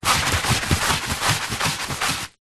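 household paper towel tissue kitchen roll rub clean tiled wall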